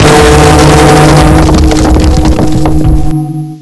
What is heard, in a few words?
bell fall